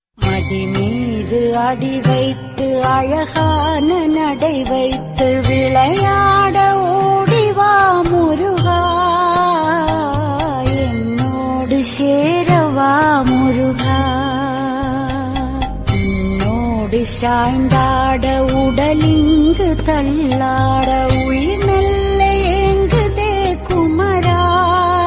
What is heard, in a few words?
Tamil Ringtone